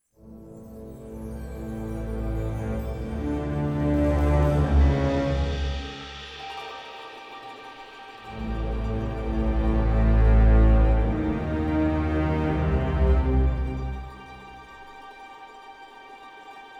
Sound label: forest cursed music; Mystery Legends: Beauty and the Beast; iOS, Windows